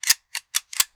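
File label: knife entering steel sheath; weapons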